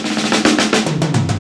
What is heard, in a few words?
Fills, Roland; Sound & Vision - Gigapack I; Perc